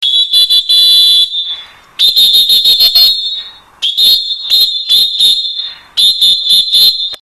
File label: alarm